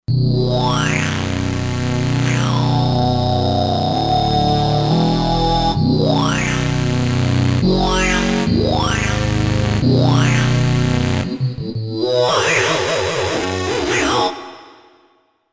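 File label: Lead Voco Loco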